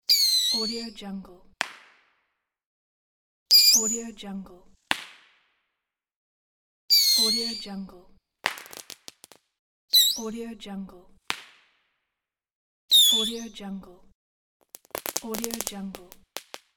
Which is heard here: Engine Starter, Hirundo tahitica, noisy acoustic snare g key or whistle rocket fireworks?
whistle rocket fireworks